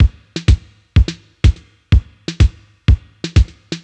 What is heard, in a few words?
drum verbe stripped 125, Drum Loops, Classic Chicago House